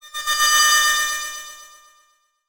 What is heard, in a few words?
Title Transition Delay Short; Adobe; Production Element